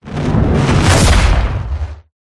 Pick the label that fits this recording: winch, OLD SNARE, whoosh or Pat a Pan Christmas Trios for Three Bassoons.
whoosh